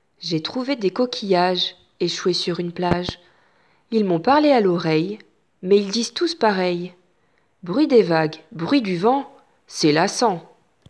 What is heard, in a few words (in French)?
vocal